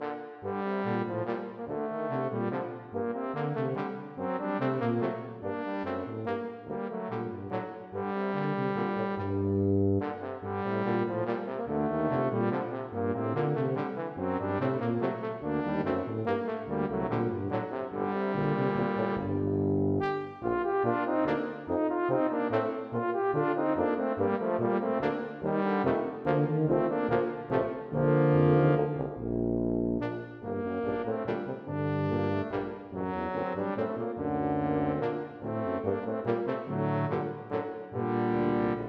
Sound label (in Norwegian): Dantz for tubakvartett; Bang; Caveler Dantz